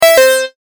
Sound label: correct; 電子オルガン風。; 正解の音・ピンポーン